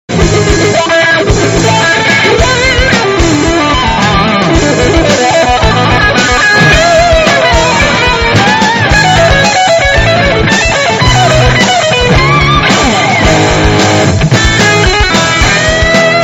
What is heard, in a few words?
guitar solo